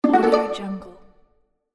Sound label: plucked violin prompt